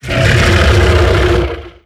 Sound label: beast vocal grumble